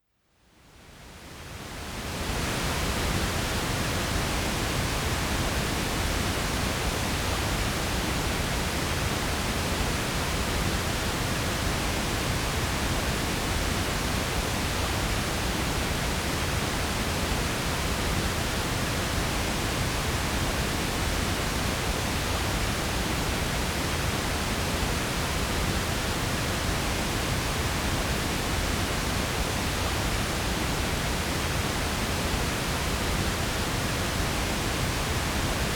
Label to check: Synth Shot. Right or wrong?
Wrong